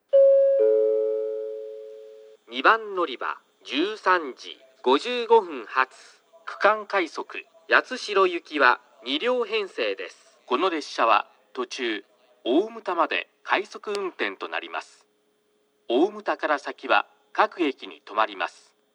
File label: tosu regional rapid yatsushiro; 2番のりば案内放送（区間快速 八代行き）; 鳥栖; 大牟田まで快速